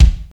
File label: natural kick drum b key, Royality free bass drum single shot tuned to the B note; kick drum samples